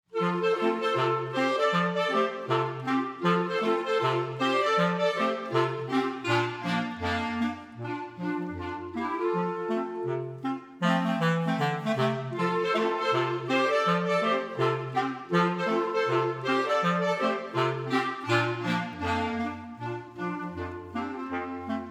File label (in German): Klarinettenensemble